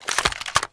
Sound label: p90 draw, weapons